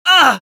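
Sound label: pain sharp, Spanish; Scout; Voice file from Team Fortress 2 Spanish version.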